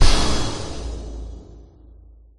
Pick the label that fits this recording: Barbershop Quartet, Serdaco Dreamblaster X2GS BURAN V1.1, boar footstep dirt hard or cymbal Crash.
cymbal Crash